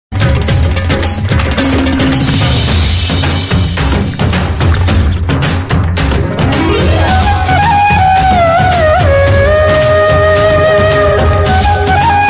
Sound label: kannada movie bgm ringtone; jogi; shivaraj kumar